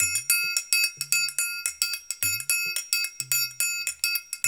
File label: AFRO, African Contemporary, AfroTriangle; Spectrasonics; Supreme Beats